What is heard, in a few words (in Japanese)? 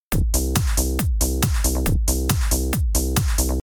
ベース